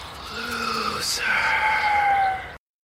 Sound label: wind